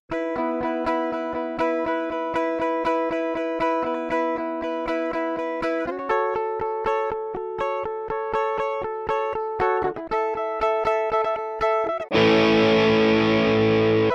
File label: Direct Input with Holds